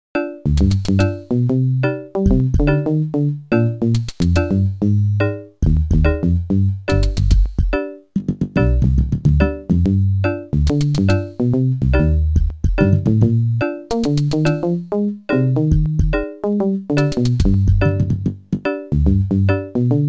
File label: OPL2